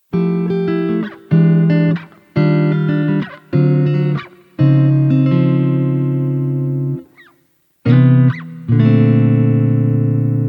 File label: Guitar Break